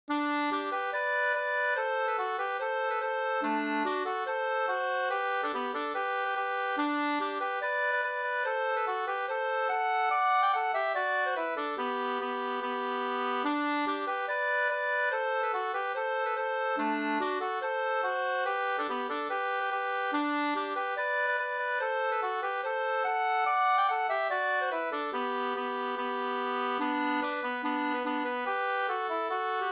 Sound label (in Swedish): reinlender